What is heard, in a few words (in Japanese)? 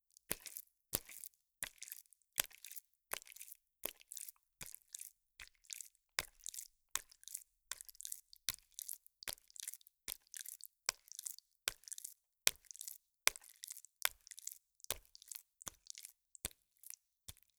パンパン